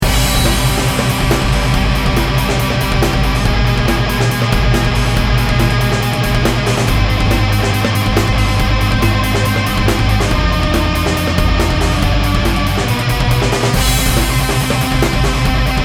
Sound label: Rock